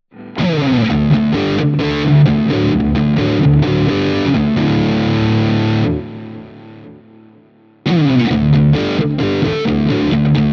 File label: rhythm